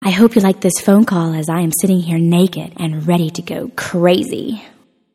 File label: Call Alert